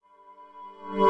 CHORD

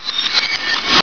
glass

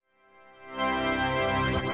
TADA

